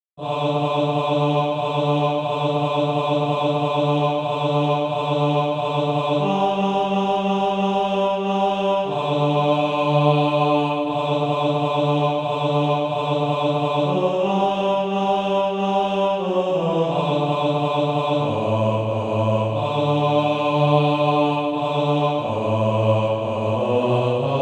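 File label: bass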